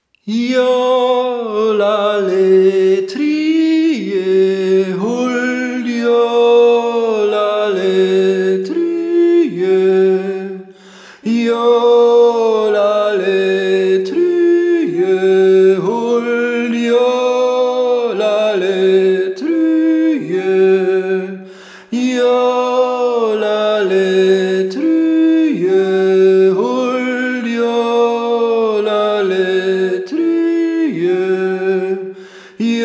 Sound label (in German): Brodjohler Grundstimme